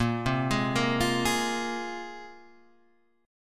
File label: Bb 7th Suspended 2nd, Bb7sus2 chord, Guitar, Standard; arpeggiated; 6,3,6,3,6,4; JGuitar